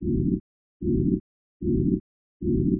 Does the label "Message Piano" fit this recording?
No